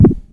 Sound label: Electron Sidstation, Drum Machines